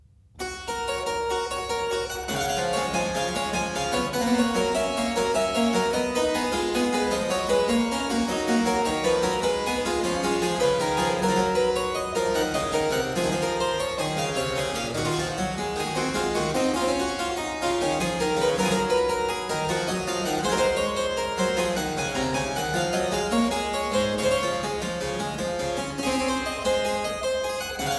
Zweistimmige Inventionen in G major, Keyboard Works; BWV 781; J.S. Bach; Inventionen & Sinfonias